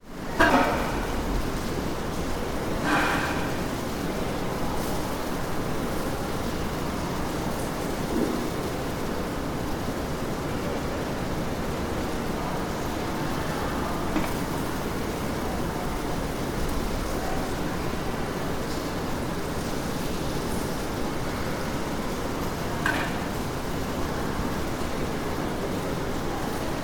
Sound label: spa